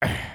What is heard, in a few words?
Walk, creatures organic